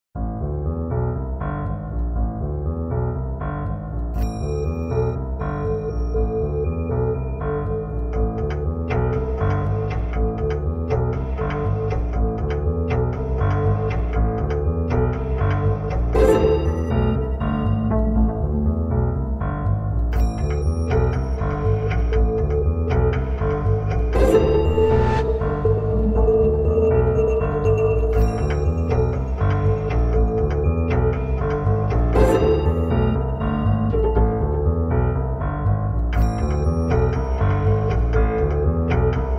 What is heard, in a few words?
rolling; The 25th Ward: The Silver Case